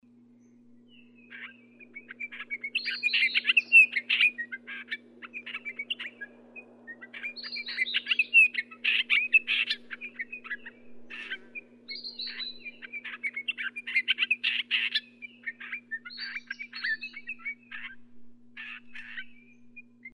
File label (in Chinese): 灰鷽; 灰頭灰雀、赤胸灰雀; 雀科/留鳥